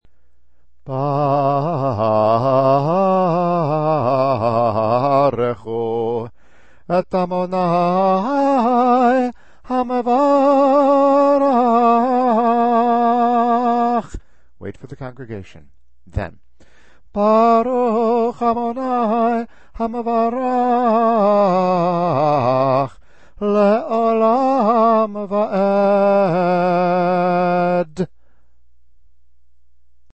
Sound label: barekhu